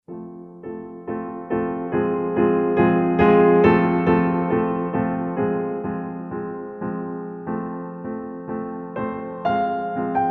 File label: piano